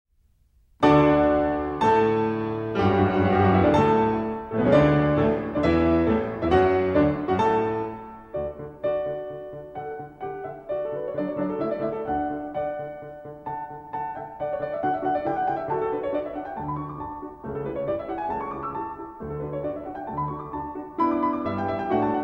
Sonata for 2 pianos in D, Allegro con spirito

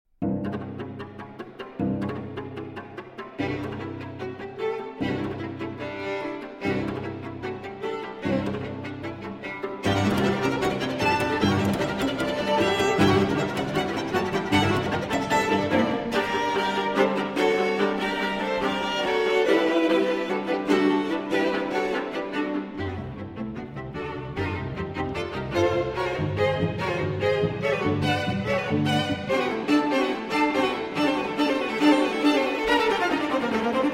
String Quartet Allegro Giocoso; Schulhoff String Quartet No. 1 - III. Allegro Giocoso Alla Slovacca

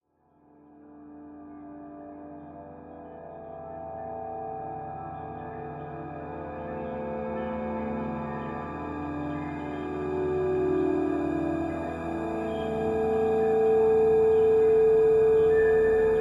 Atmospheric Lightness

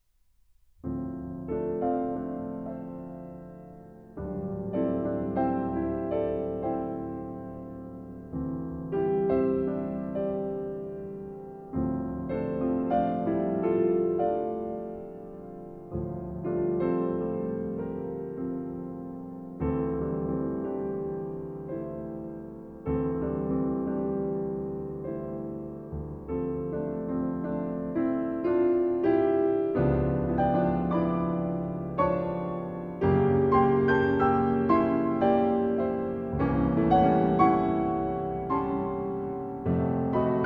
Solo Piano Notturno; Partita For Solo Piano II. Notturno

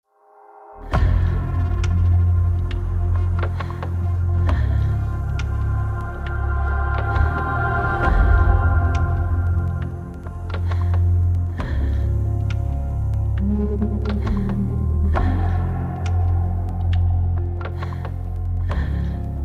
Karaoke Version

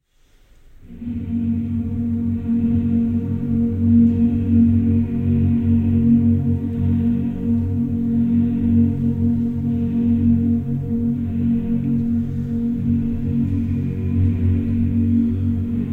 Choir, 360° 8D Binaural Version

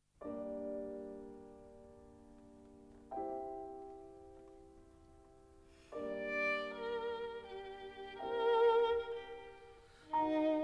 Violin Sonata in G Minor, Allegro vivo; Debussy; CD 148, L. 140 I.

